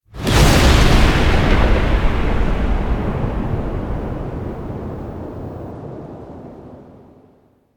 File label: Undercroft Portal UI 2D; Duviri